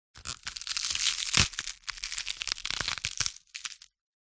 horror bone break hand stand on crush

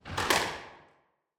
sport squash ball hit against court wall

